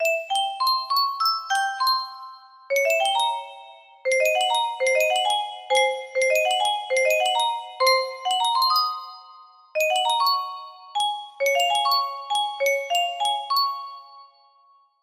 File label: music box melody